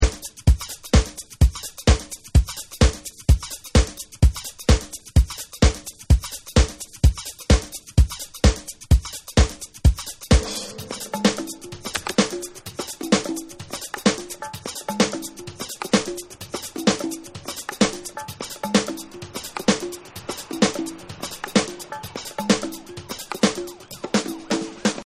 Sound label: Drums